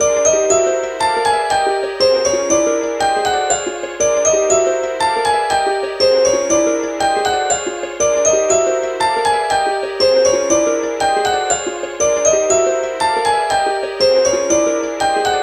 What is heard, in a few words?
Drowse